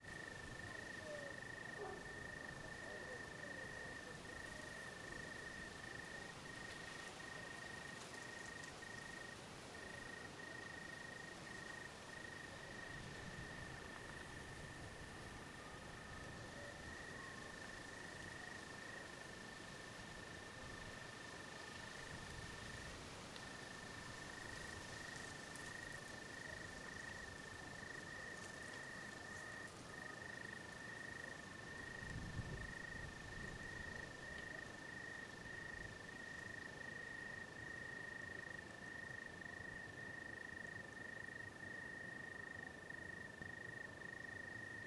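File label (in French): Nuit, Paysage sonore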